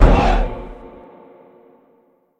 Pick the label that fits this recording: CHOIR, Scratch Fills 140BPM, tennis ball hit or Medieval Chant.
Medieval Chant